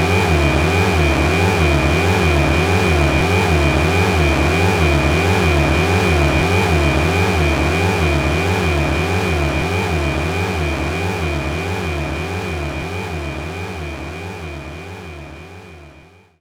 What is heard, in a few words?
Enemy Gear Active Loop